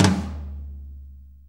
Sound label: 4-4 Tom Flam, Drum Loops; Drum Tools; Flams